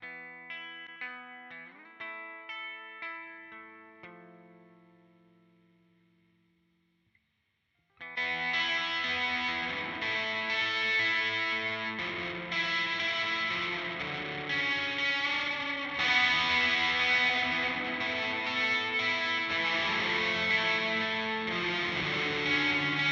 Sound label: Walrus Audio Melee R D 2